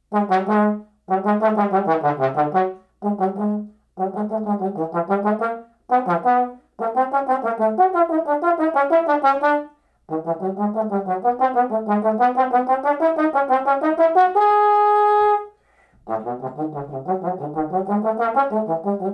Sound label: arioso trombone